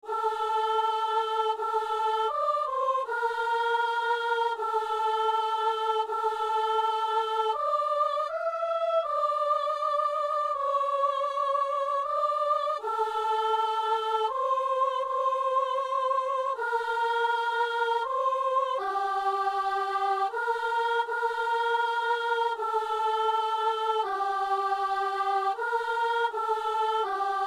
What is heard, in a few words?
soprano; Messe du 17 janvier à Saint-Denis